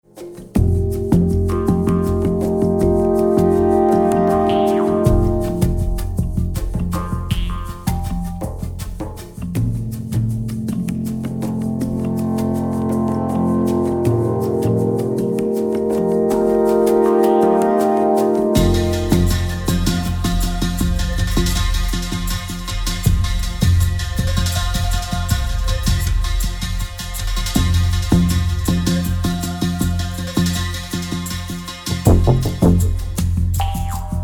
Alphorn Trance

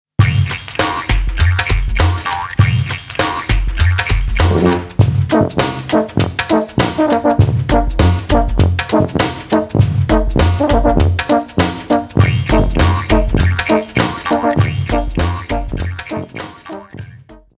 Maultrommel Polka – Jews harp polka

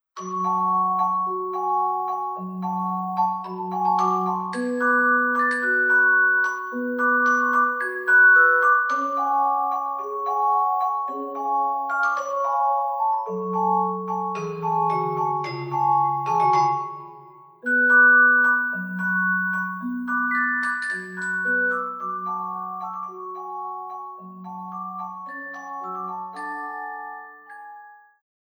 Celesta

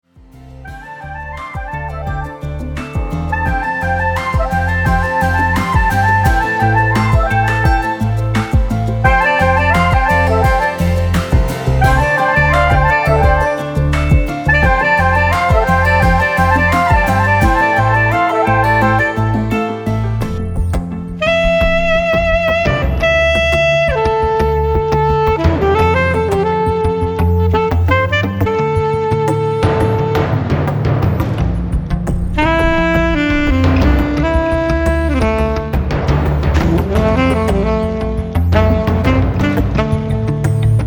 LOUNGE GROOVE

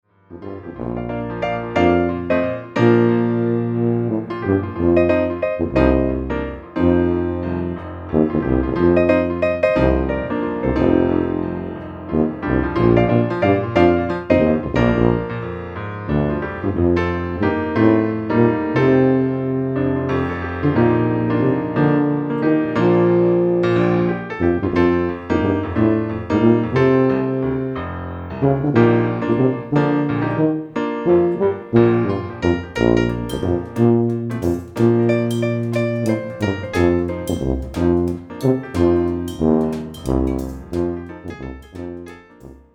Hip Groovy Hip Waltz; Bavaria

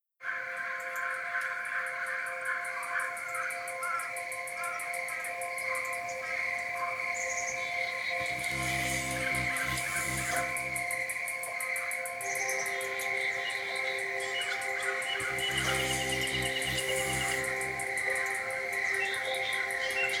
MUSICAL INSECTS

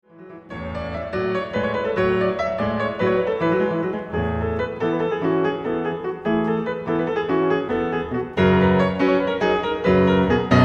Bulgarian Dance

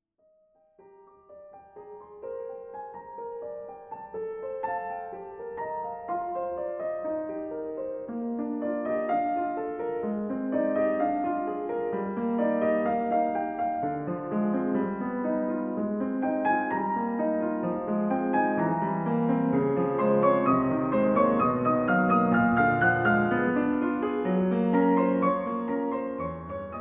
Piano reflections

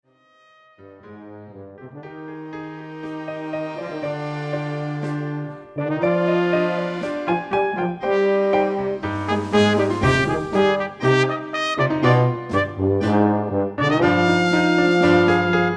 Tango

